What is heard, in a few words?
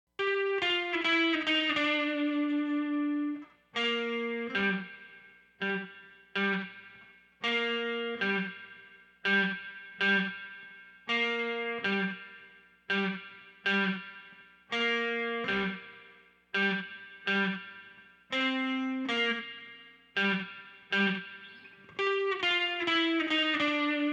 Carolina Blues lead guitar track